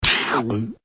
Swallow

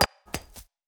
Metal - Kick Can; Ed Edd n Eddy; Adromulus Pack

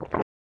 Swallow; Ed Edd n Eddy; Adromulus Pack

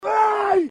"Ugh"

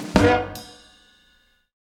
Band Hit; Ed Edd n Eddy; Adromulus Pack

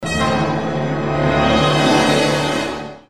DUUUUUUUUUUN!, Suspence sfx

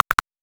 Knuckle Crack; Ed Edd n Eddy; Adromulus Pack; EEnE

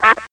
Quack

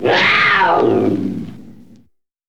Cougar Snarl; Ed Edd n Eddy; Adromulus Pack; EEnE

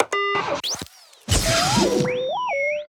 computer goggles start up; Hide n Seek; Ed Edd n Eddy; Adromulus Pack; EEnE

